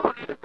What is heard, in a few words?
wah snip, Funk Gtr & Wah